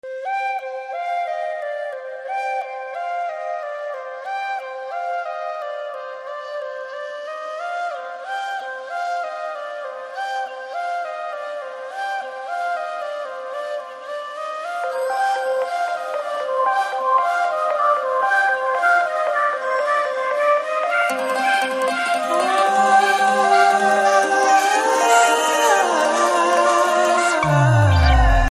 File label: Flute BGM Ringtone